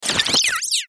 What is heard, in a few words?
Glitch Reverse Warble, Glitch Distortion Pitch Warble; Production Element Imaging Element Accent Transition; Blastwave